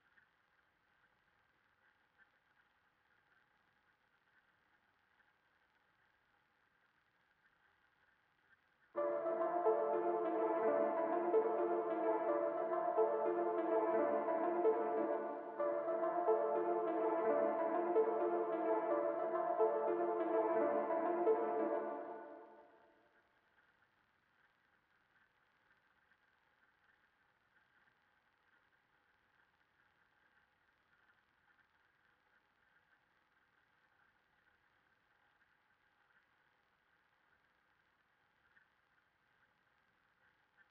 145 BPM D Min Accent Arp Synth; Melodies; Loop Stems; Cymatics; 2022 Melody Mystery Expansion; Hidden Hills

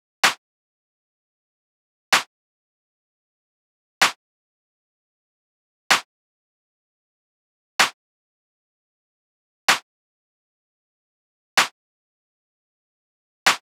Drum Loop - 127 BPM Clap; Loop Stems; Cymatics; DOPE DRUMS VOL. 1; Grand Slam Drum Loop

